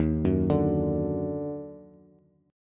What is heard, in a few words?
Dsharp Minor Major 7th Bass; arpeggiated; chord; x,6,4,7